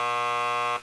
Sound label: Buzzer